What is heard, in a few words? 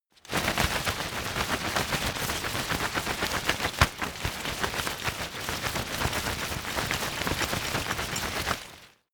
umbrella big shake rustle hard